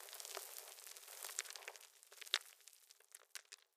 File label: rockfall; dust2